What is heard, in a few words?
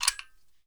m24 load, sniper; weapons; garrysmod